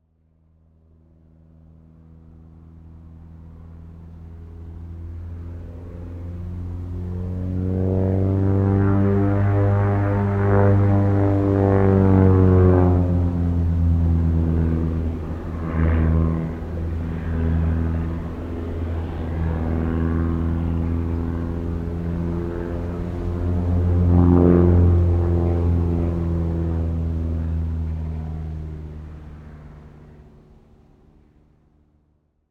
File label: transport aircraft pitts s1 special acrobatics close by airshow